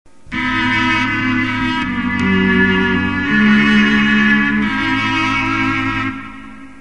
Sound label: viola